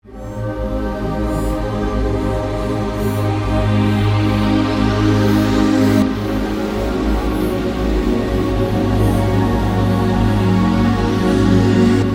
ambient

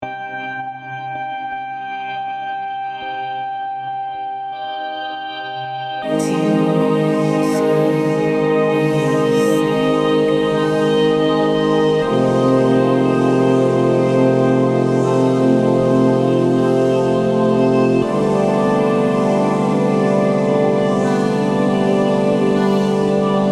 ambient

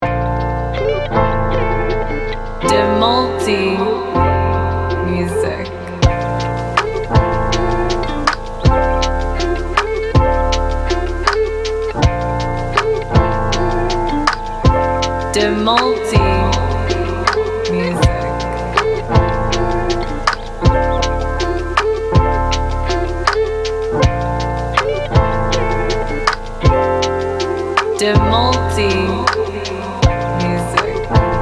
lofi

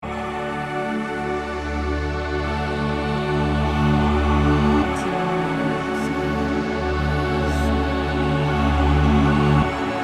ambient, Musik ini menjaga rit

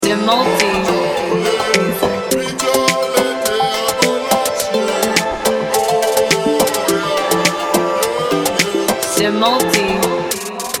edm

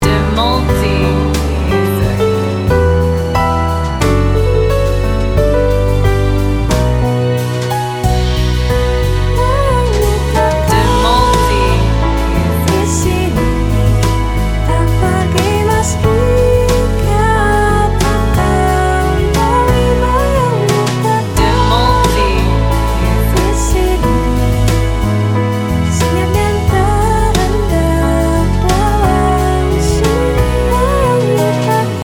pop

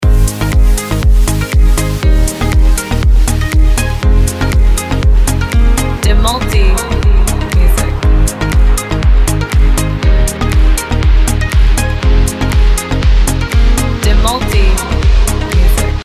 instrumental edm; Nuansa glamor langsung hadir, seolah lampu sorot jatuh ke panggung. Setiap lapisan musik memberi kesan eksklusif, penuh gaya, dan percaya dir

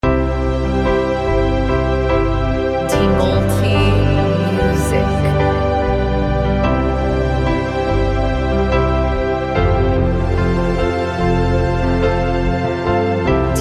instrumental modern piano; Di tengah gelap dan sepi, kadang yang muncul bukan teriakan, tapi secercah cahaya kecil. Lagu ini seperti lilin di ruangan

